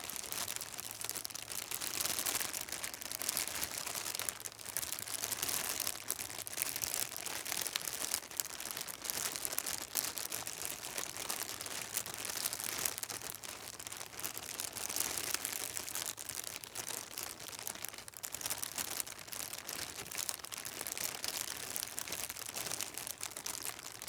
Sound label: plastic handling, wrapper